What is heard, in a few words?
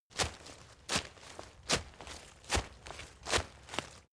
step leaves wet sequence; schritte